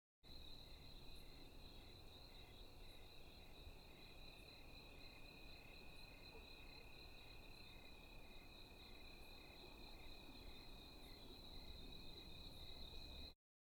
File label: Crickets; Crickets (Sound Effects:Animals:Insects); This sound effect was recorded in a custom built sound booth